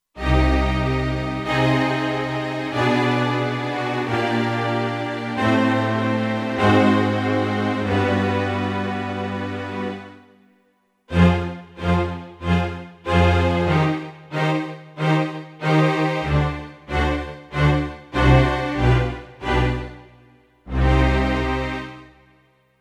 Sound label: Classical Strings, Kurzweil K2500X; Factory Programs